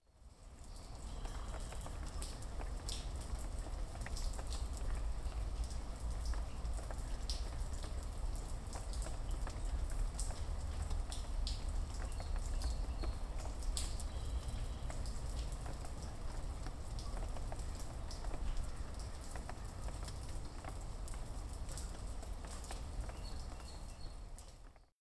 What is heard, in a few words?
Rain light courtyard birds; 16bit